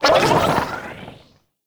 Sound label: Anima Switch Burst; Warframe; Warframes; Anima Animus